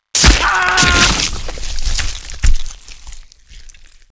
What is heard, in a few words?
knife stab; zombie plague